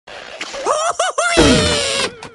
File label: Red Bird Flying And Attack Ringtone